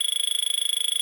Sys Timeattack Icon Rank Down; Sys Timeattack Icon Rank Up